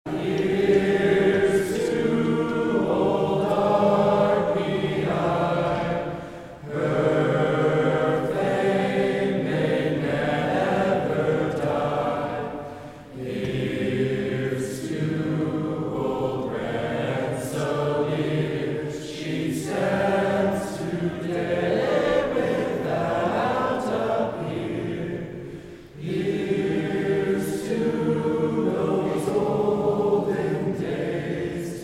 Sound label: Alma Mater Vocal, pepband